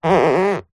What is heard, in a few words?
household leather seat cover squeak rub movement hand